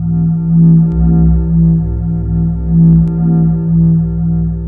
hologram; Earth and Beyond; EA Games

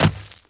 land dirt; ambient; Shadow Man

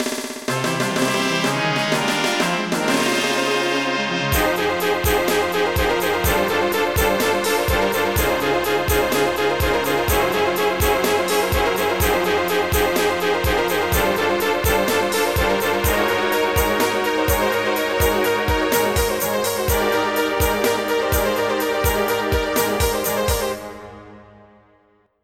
fan; FF7DEMO

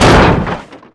HEAVY, COLLIDE; ImmersionRacing

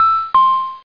send dialog

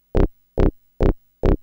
Bass cut; Techno; TechnoMusicMaker

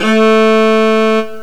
Fiddle; GMPlay; Ethnic

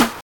ele Snare

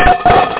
payout

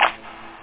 snap; da capo vol1; drums nature

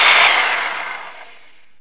buzsaw; REDNECK; RAMPAGER

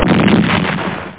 fireball

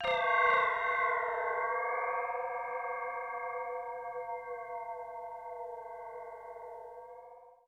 ethno 125; eJay

